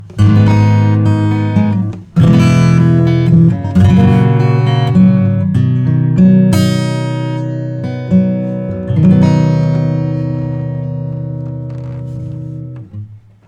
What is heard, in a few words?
acoustic Punchy Acoustic Guitar; Joe Chiccarelli